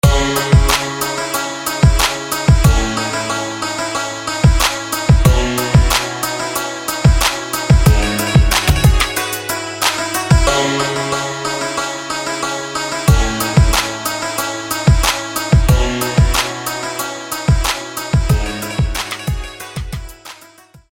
Echo Dulcimer

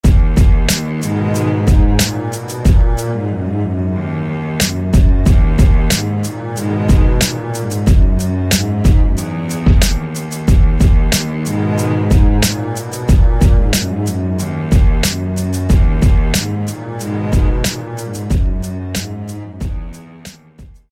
Lush Cello; SFB FX

